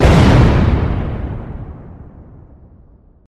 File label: explosion